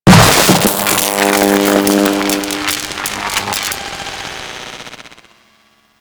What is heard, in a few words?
Flashbang Electric A; Grineer Death Squad